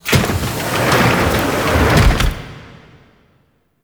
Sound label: Machine Multi Door Open; Orbital Station; Caliban; Warframe; Tau